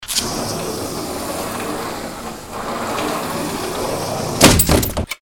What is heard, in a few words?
door sliding close